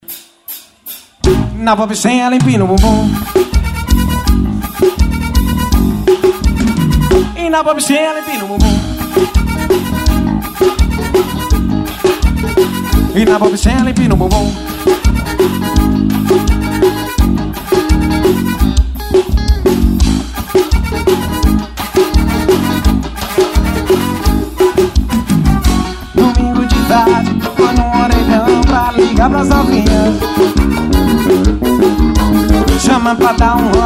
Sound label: pop